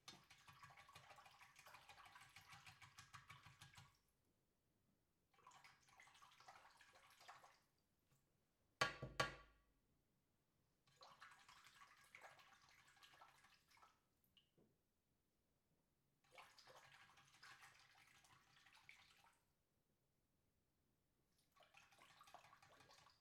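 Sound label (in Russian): бритвенный станок промывают в воде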